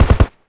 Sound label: polycraft 3 shot far